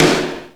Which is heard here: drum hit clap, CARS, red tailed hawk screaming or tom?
drum hit clap